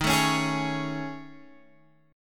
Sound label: A Major 7th Flat 5th